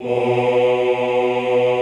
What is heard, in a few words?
OHH B1, Classical Choir; Best Service; Extended Classical Choir